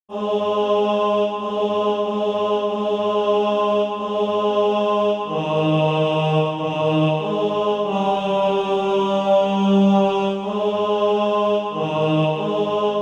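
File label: tenor; SATB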